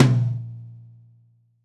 Brush Tom

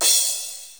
Crash Cymbal, Yamaha DD-7

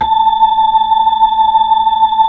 B-3, Hollow

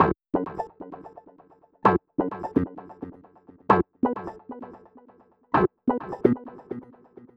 PERC, Hip-Hop & R&B; USB Soundscan vol.47; AKAI

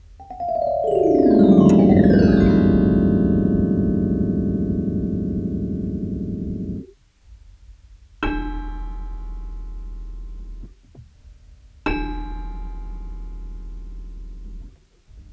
RHODES FX; PlatinumPhattCD2; E-MU Producer Series Vol. 8 – Platinum Phatt

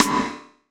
rim, gated reverb; musicradar

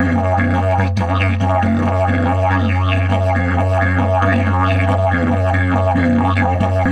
F 140, DIDGE RIFFS

